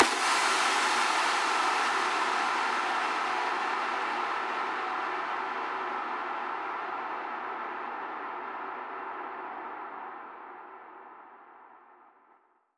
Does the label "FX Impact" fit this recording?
Yes